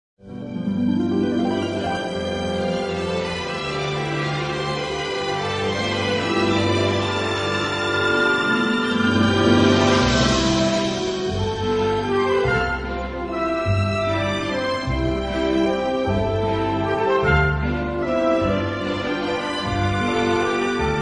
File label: Waltz (Instrumental); Chocolate Com Pimenta